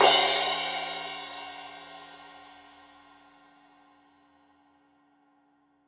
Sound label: Crash 15in L edge, Cymbals Stereo; Deeply Sampled Drums